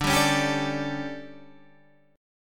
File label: D Major 7th Suspended 4th, Guitar, Half Step Down; DM7sus4